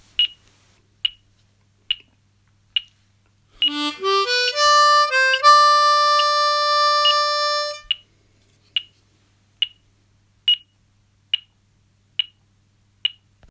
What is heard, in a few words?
Beginner Triplet 2 Bar